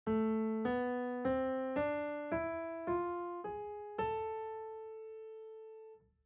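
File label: scale harmonic minor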